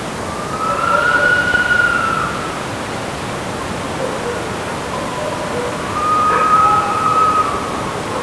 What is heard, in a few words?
owl